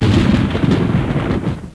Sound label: thunder